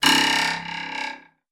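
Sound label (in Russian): звук дверного стопора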